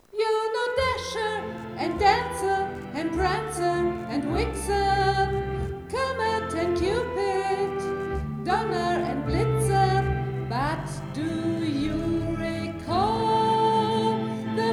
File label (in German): Gesang Orgel